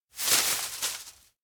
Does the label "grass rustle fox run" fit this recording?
Yes